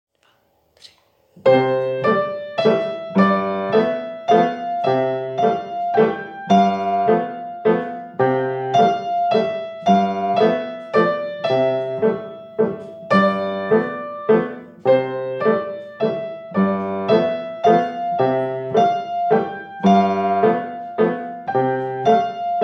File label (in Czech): klavir